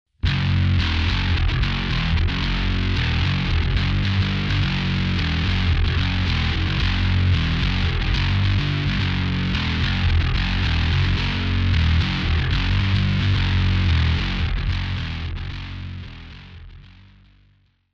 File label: distortion